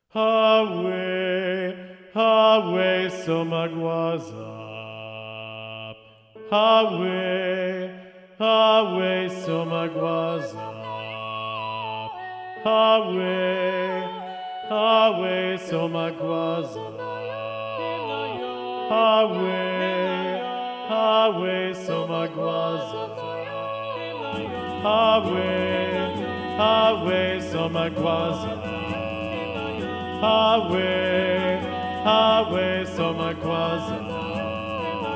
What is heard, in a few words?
SATB - Bass Predominant; Somagwaza